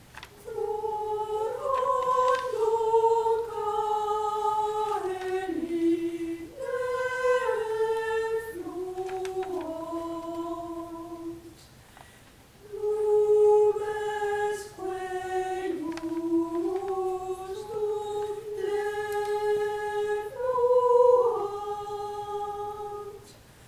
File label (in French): Sopranes; Mesures 1-60; Rorando Caeli